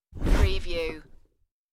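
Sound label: Sit Cushion Chair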